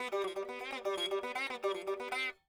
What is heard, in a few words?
sitar